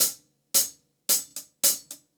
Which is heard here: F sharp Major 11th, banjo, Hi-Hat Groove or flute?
Hi-Hat Groove